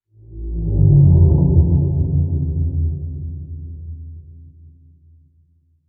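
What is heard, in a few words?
Creature Demon Belly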